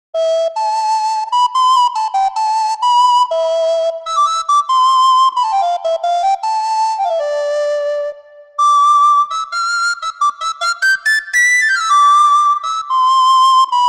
Recorder Alto; Kontakt Wind Paks

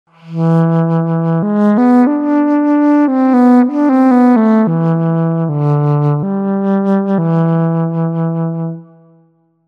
Bass Flt; TURBO VL

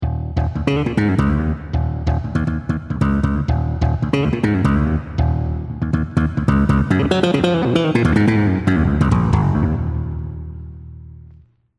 E Bass DX7

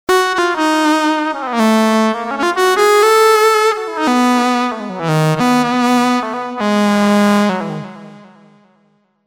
Pulse Ld; TURBO VL

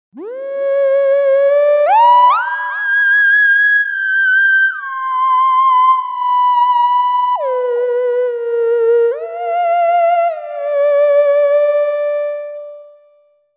Theremin; TURBO VL

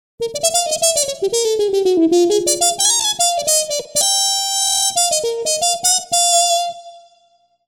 Hrm Mt Tpt; TURBO VL

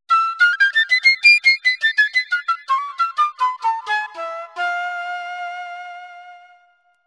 Flute

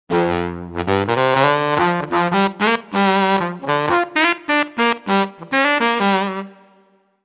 Bari Sax; TURBO VL

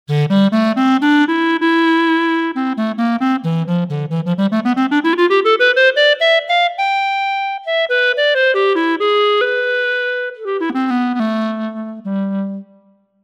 clarinet; TURBO VL

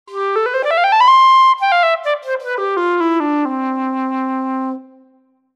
Silver Flt; TURBO VL

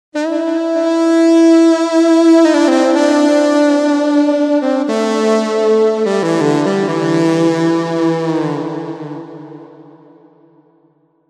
Cello Sax; TURBO VL

